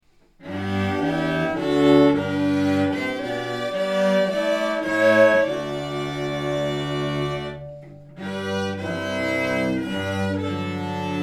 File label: Chorale; Chorale BWV 43; Baroque Era , Classical; 4 Cellos , Cello Ensemble